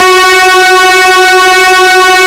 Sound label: HEAVY F#3, LEAD SYNTH; Best Service Alpha Dance vol.2